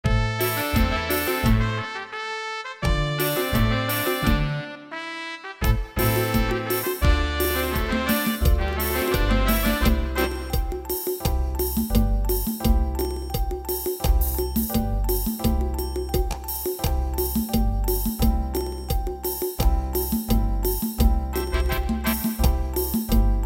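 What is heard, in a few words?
Ta Loong C major, Thailand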